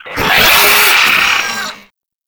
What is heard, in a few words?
Moa Enemy Spotted, Vocals